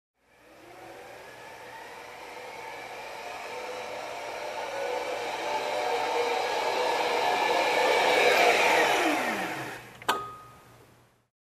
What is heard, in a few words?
Vacuum Cleaner turned on and off